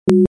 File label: Digital tone system beep